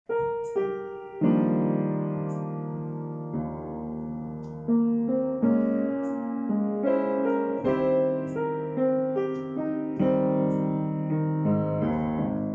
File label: Audio jazz